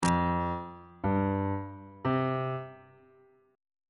e sharp suspended 2nd triad chord intervals on bass clef